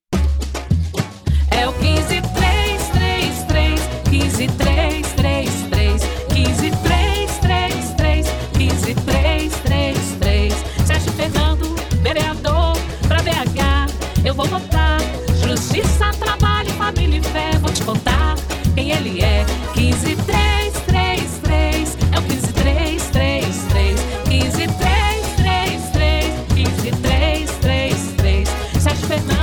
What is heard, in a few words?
Jingle; axe sf